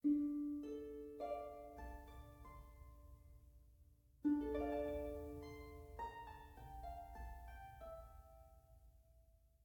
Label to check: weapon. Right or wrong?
Wrong